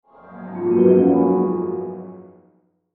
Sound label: Pixie Dust Low Sweeper Mysterious Magic; Blastwave